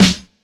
smooth bottom end snare drum sample F sharp key, Royality free snare single hit